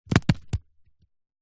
foley rock small roll land compacted damp mud ground; zapsplat